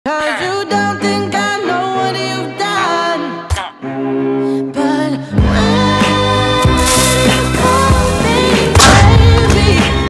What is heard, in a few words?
slowed reverb